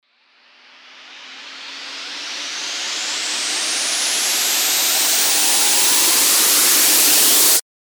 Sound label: RISER